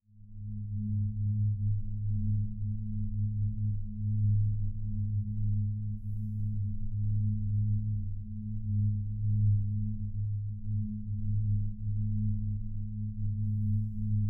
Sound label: atmosphere